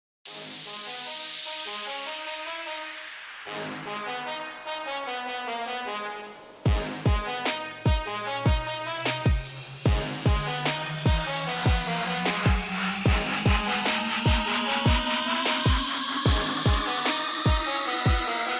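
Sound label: rhythmic medium tempo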